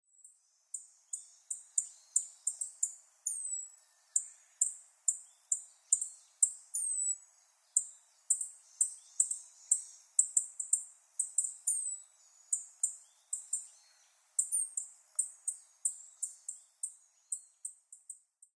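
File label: yellow bunting, minamiaizu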